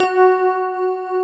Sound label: SYN K-2000, Roland